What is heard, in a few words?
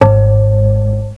Bong; Cloudz 1.0.3